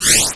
Zoom Up; MacPPP 2.1.1SD; Config PPP

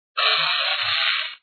snake hiss; garfieldfutbol

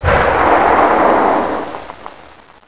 missile

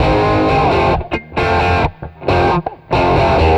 Rhythm Guitar

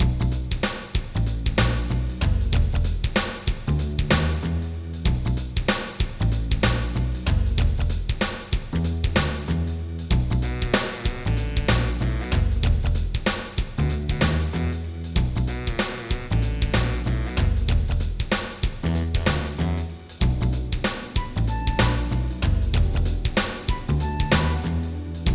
jazz; General MIDI

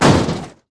collision; CRC2005; CRC 2005 Demo

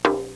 dumbecki, percussion instruments; Sound Effects for Multimedia - Volume 1

